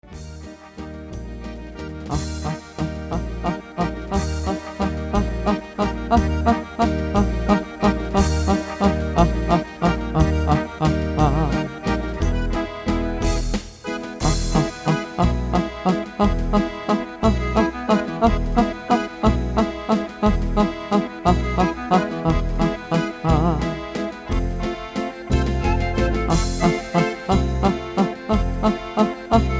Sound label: staccato